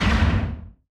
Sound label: distance plasma fire, weapons; Stellaris